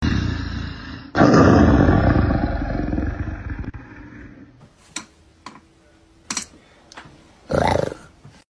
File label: Tiger roar challenge; چالش غرش ببر